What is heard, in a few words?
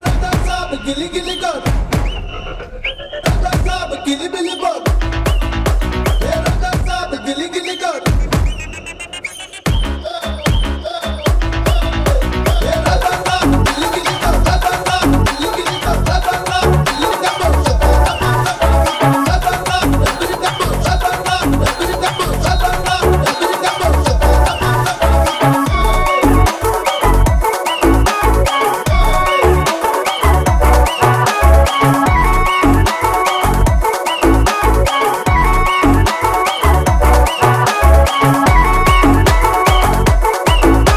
Trailer BGM; The Raja Saab; bollywood